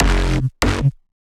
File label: Bass